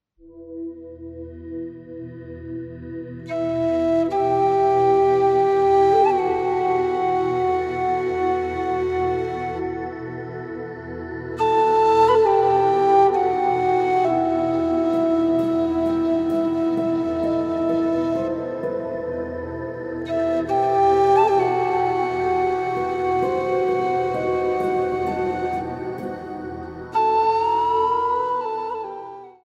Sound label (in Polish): instrumental, Wersja instrumentalna